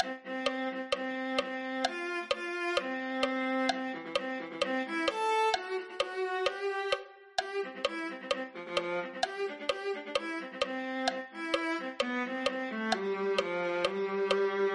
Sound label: viola